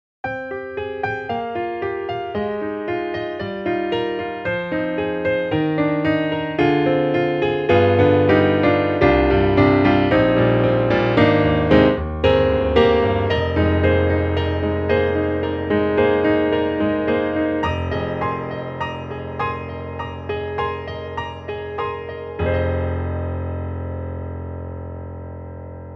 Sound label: Keys Studio Grand Tight Studio; XLN Audio; Addictive Keys